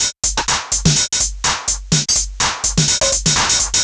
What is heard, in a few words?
drum loop top 125, Classic Chicago House; Sample Magic